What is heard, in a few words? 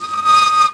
bmx brake; terrains; Tony Hawk's Project 8; XBOX